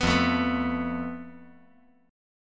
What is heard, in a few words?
F Major Flat 5th